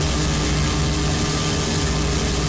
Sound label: link gun beam; BLinkGunBeam